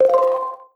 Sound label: Cashpot Inc; Cosmic Crazy Cash